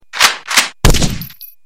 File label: Famas F1